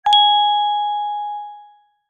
multimedia notification bell chime ping long decay